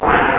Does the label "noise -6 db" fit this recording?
Yes